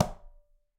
Tumba B Slap Mute, Conga; Latin Percussion; Drums; Drum Hits; Ableton; Factory Packs

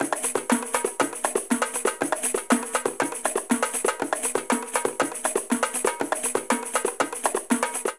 Samba Tambourim Pandeiro 120bpm, Latin Percussion; Ableton; Factory Packs

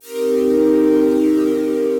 Keys Mellow Morning, Synth Pad

